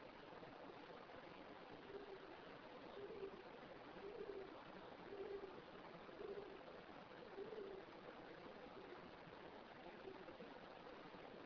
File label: Weird Noise; A whirling type sound, possibly pigeons or some kind of owl, but the amplitude suggests otherwise; Weird Noise 1; Bisbee/Tombstone